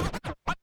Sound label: Cuts, Vinyl Record Samples